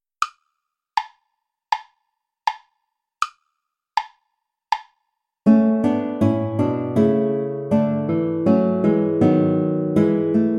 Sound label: play along without guitar